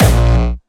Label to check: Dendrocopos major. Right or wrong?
Wrong